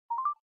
galaxy watch call started

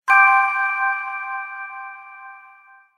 notification alert; xz2 iridium

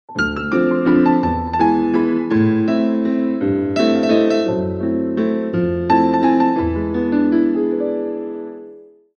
Twilight dance

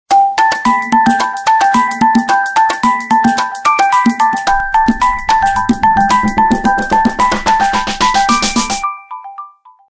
cosmos; tecno

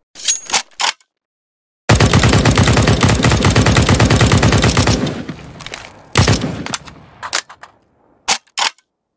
sg 553; csgo

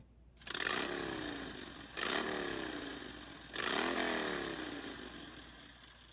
motorcycle, iphone

